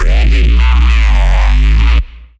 Reese; neuro; BIG5AUDIO

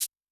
Shake; Yamaha DTXpress-II; Perc

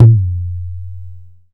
DH, Indian Percussion Series Tabla; Mango Loops

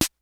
CLASSIC, DRUMS; Kid Nepro; Elite

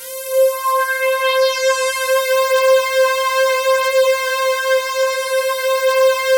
XXL SWEEP, BIG SWEEP; Masterbits; Vintage Voltage

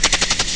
echo drum; Amiga Soundtracker Sample Packs

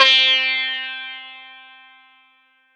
Plucked Electric Sitar C4; Acoustic and Electric; Polyend; Simulacrum Sounds; 1 Shots

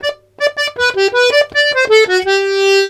Accordions; USB Soundscan vol.40; AKAI

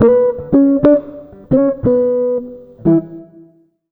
jazz, acoustic guitar loops; USB Soundscan vol.04 - Electric & Acoustic Guitar Loops; AKAI

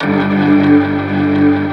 G1, Keyboards Old School; USB Soundscan vol.09; AKAI; BIG HAMM

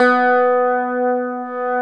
GTR CH STRT, Clean E.Gtr, Ultra Sheen; Roland LCDP02 Guitar and Bass

